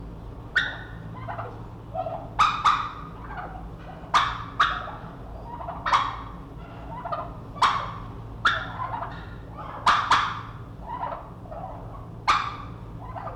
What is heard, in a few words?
female calls